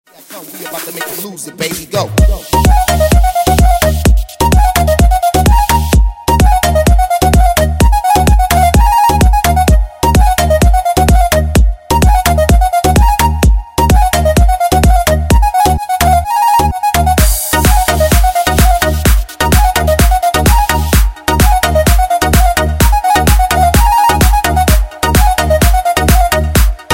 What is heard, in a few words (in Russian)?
PRIKOLNYJ zvonok